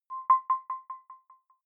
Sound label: button echo beep resonance; maschinen; signale